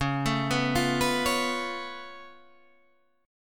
C sharp Augmented 7th C sharp down Guitar Half Step Down, chord; C#+7